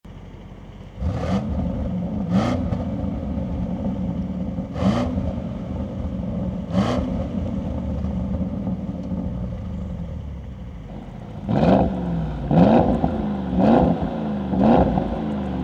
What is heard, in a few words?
SQ8, audi s q8